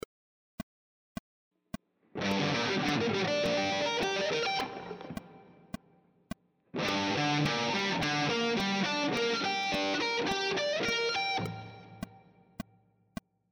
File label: triad arpeggio sequences Gm, guitar